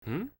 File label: "hmm"